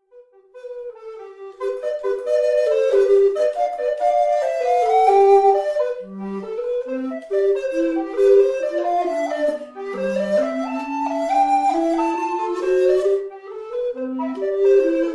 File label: Duos for Recorder